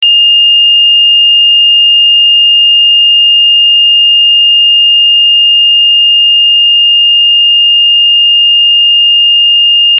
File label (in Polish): ton